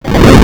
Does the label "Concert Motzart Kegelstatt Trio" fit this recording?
No